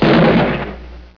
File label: fall; Alien Arena; marvinmartian